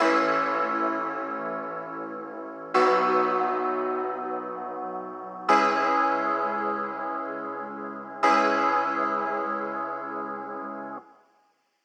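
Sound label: LIQUID DNB SONGSTARTER A 175 CHORDS; KULTURE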